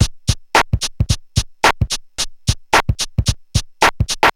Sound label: Korg MR-16 (110BPM), beats